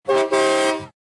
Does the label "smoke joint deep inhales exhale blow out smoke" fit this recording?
No